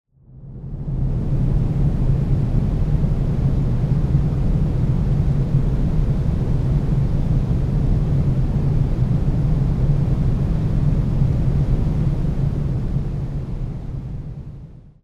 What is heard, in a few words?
banana noise